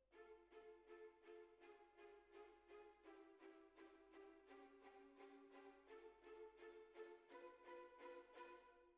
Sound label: Clarinet